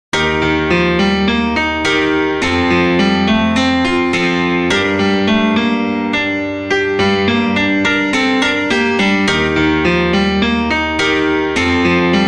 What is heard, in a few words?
piano